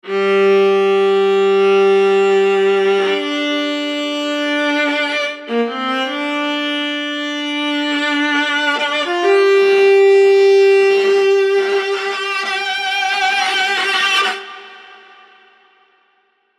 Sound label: Viola Edgy